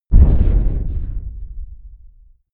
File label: big metal impact low dull